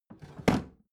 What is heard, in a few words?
bathroom drawer open regular